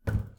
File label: stall shut; garrysmod; sunabouzu